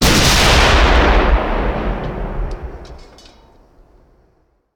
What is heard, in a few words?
Artillery Cannon Artillery; Sound Ideas; Guns